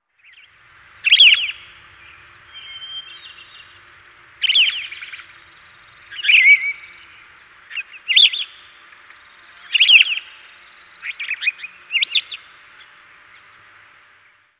bird distant chirp; cod modern warfare 2; amb emitters

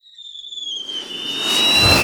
mortar incoming; Explosions; modern warfare 2

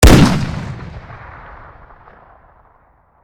fire; black ops 6; iw9; weap india fire npc

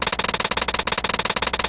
hammer; modern warfare 2; super-mario

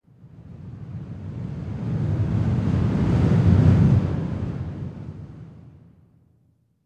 wind buffet; amb elements; modern warfare 2

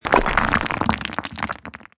rubble; amb elements; modern warfare 2

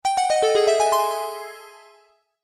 emergency airdrop; killstrk; modern warfare 2

